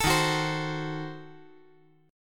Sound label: Eb Diminished 7th Eb; Ebdim7 strummed; 14,15,14,15; JGuitar